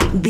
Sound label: footstep metal low run